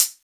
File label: Hat, Drum & Bass